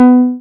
SYN TBM C5, MC202; Sound & Vision - Gigapack I CD 2

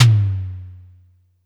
Drum X Tom, Pearl Drum-X

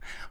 VOX; ILIO - Ethno Techno

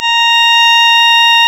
Synclav FM, WIND, A#; Big Fish Audio

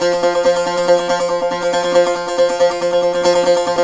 SAZ; Roland - LA Composer vol. 3

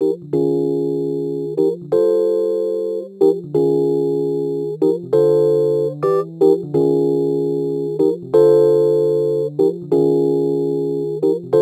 Rhodes Attacks; Ueberschall

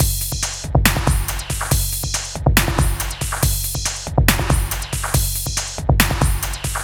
ELECTRO LAVA, PLASTIC ELECTRO GROOVES

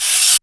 CABASSA; PERCUS KIT; AKAI

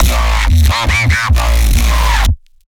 growl attempt A sharp, bass

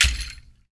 HAND, Afro Shakers, Ankle Pods

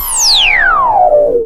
scifi, SCIFI & SWEEPS; Techno Trance Essentials

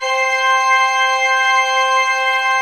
C4, Cathedral Organ, MANUAL PLENO; Propeller Island

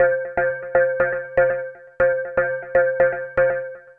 Synth

